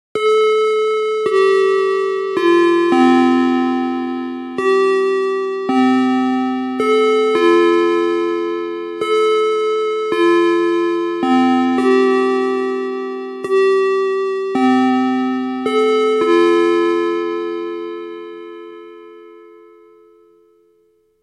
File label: chime; denon